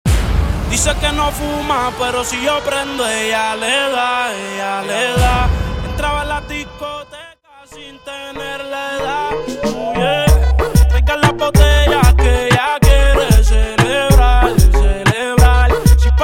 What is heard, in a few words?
Acapella Starter Blend Intro 94BPM